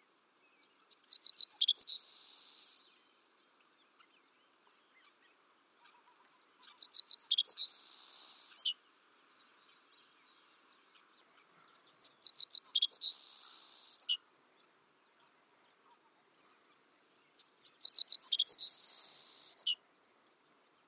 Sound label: Savannah Sparrow